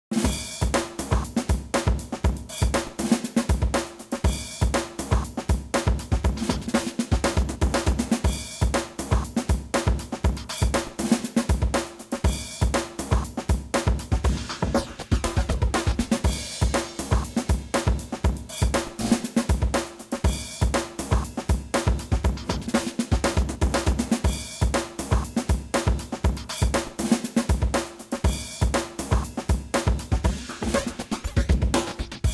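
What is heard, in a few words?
Round Results Percussion; Worms Rumble; PS4 PS5 Switch Windows Xbox One Xbox Series XS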